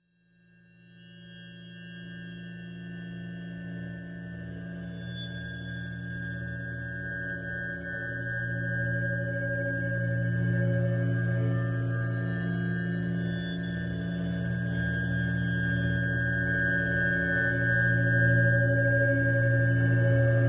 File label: Tense Horror Background Atmosphere